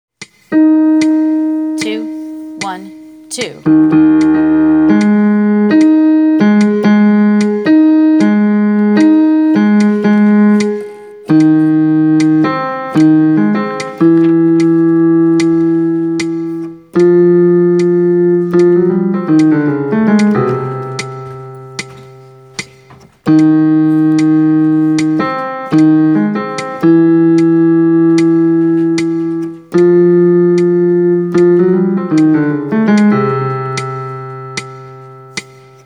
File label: bass